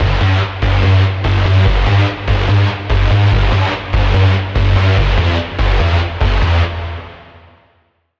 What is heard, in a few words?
Lead - Glitch Cadence; Routed Sound; Vital Expansion - Reality Maker Vol.1